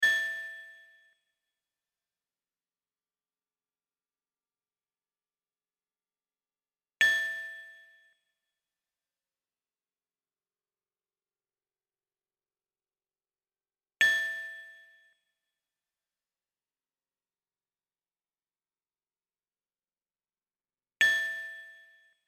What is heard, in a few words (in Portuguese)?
amostra de pulsos com periodicidade